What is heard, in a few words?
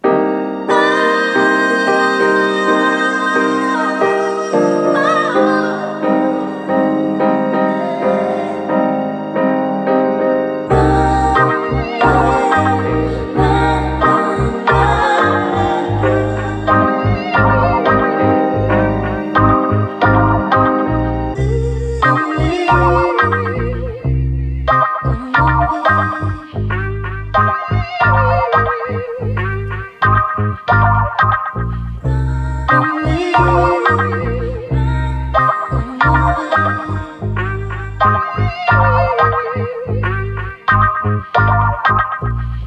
90 BPM C Min, Melodies Classic; Cymatics; ZODIAC Beta Pack